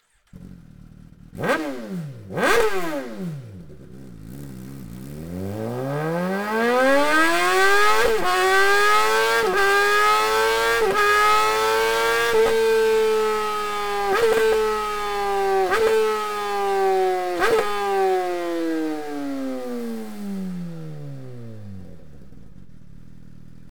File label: slip on; akrapovic